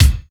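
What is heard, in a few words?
electronic bass drum; Best Service Hyper Dance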